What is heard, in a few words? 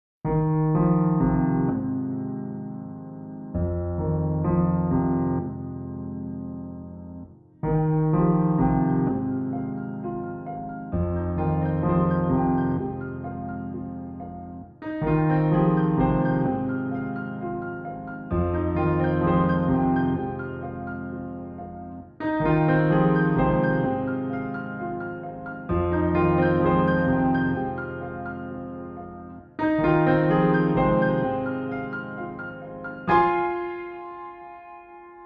Pianos